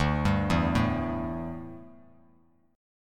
D Suspended 2nd Suspended 4th, Bass Standard, chord; arpeggiated